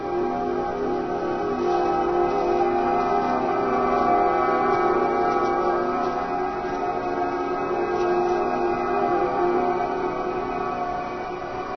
flock snd loop; moul-assets

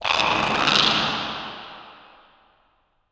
Bahro Timid; moul

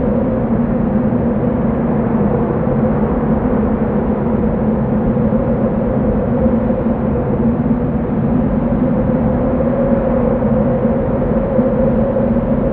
Chasm Main Wind Loop; moul-assets

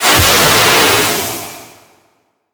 Fumerol Blast

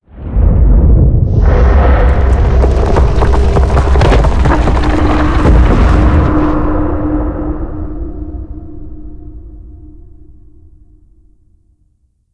Fissure Stage

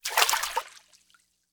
Water Walk

